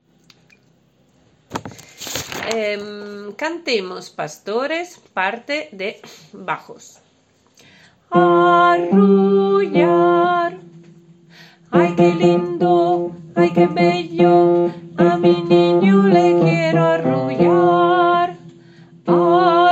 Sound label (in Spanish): bajo, villancicos